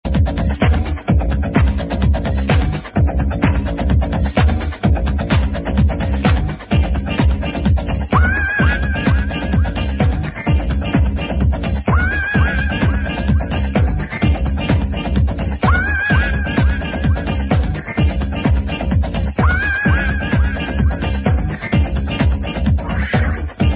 cavern like bassline; legends warrington feb march 92

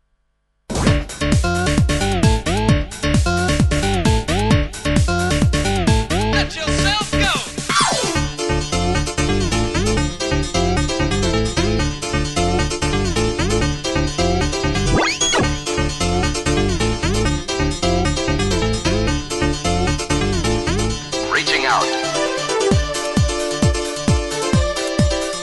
piano

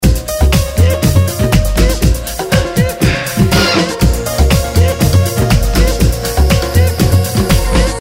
salsa

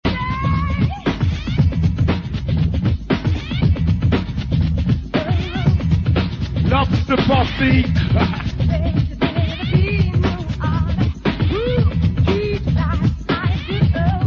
vocal samples; amnesia house one step beyond nr leamington spa june or july 90

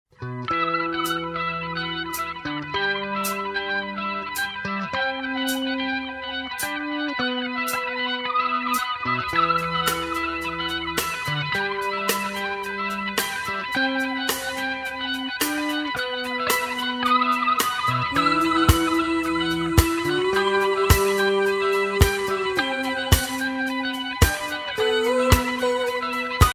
happy house

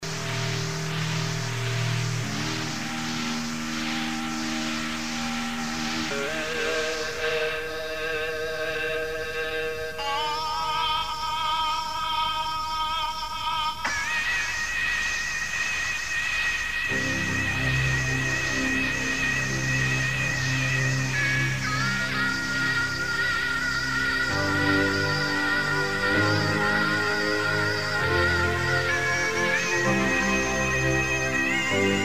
guitar

